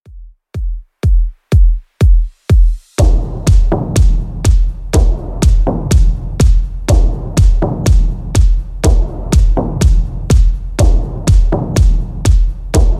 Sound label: 90s, 130bpm